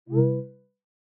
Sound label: chat request sent